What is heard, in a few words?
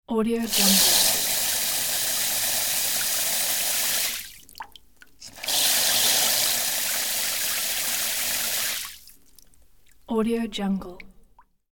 shower spray in short bursts